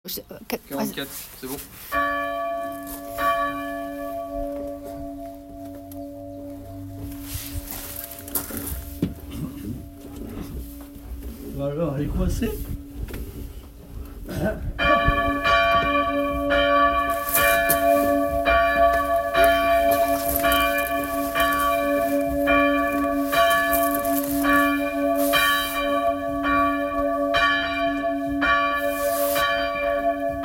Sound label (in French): Sonnerie des cloches de Saint Arnoult à 19h44